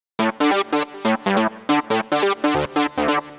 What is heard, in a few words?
melo, party